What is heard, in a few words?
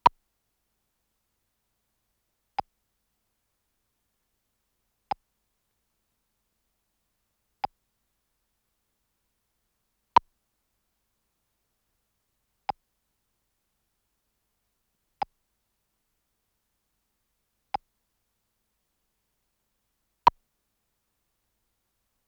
Tape Click